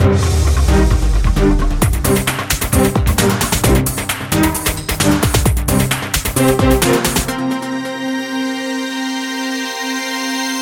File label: jingle TV